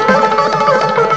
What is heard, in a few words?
SITR PLAY; M8; CMI Factory